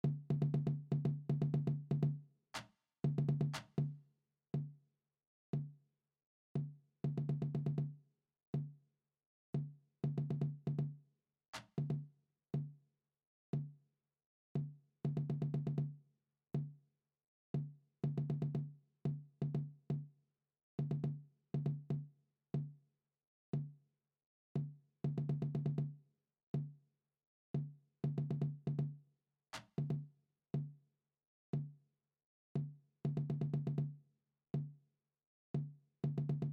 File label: marching cadence bass drums; warrenton